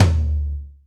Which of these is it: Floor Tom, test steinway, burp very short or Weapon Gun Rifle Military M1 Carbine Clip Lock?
Floor Tom